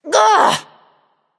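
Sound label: ugh, female; smite; voicepack; hera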